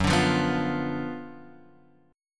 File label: Gb Major 7th Suspended 4th Sharp 5th, Guitar, Open F; strummed; x,1,2,0,2,0; JGuitar